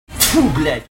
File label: spit